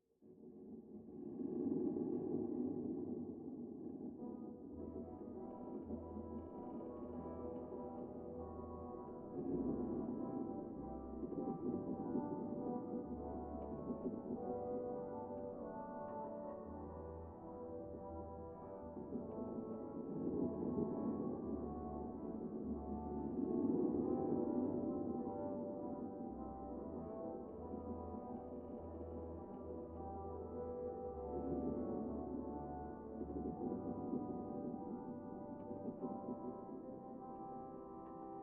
Sea bottom Sound amb Accordion; Return to Monkey Island

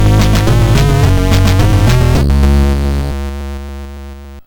Jingle Slow

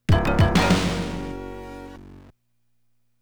Level Start; Jim Power The Lost Dimension in 3D; genesis

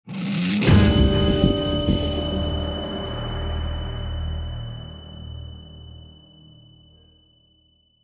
Shock (Stinger)

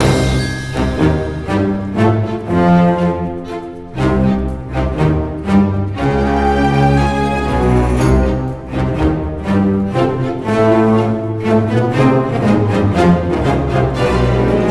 Elephant Loop; Gnarled Forest; lego universe macos windows gamerip 2010

